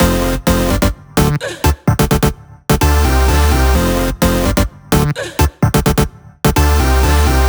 128BPM Simple Melody; VFH1 128BPM The Simple Kit

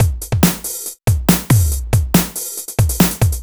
Breakbeat 140 BPM

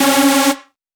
Synth Cuts; Vengeance Samples; VES1 Instruments

